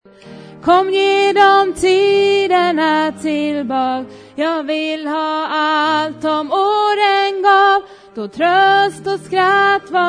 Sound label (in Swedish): bas